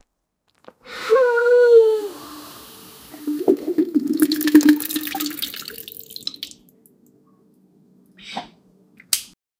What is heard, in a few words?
woman throwing up in sink; vomit